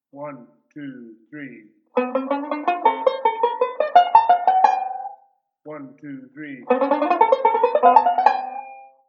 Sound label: ii V Pattern, banjo; Beyond Chord Melody Volume II